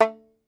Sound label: Tenor Banjo, Plucked Strings